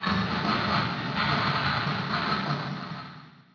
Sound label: rubble; hl2